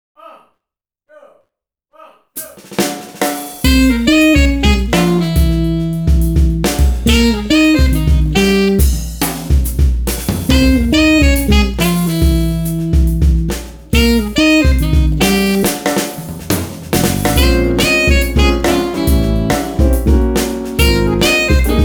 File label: Jazz (Taiko Session Live Version); Taiko no Tatsujin Doki Shinkyoku Darake no Haru Matsuri; PS2